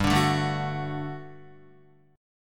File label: G Major 7th Suspended 2nd, Guitar; strummed; 4F,4A,5C,5G,5C,6E